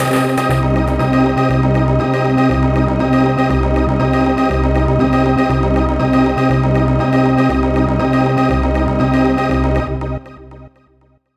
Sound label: C4, Synth Line; 90 s Sample CDs; NiceBeats; SynthLine VOL-7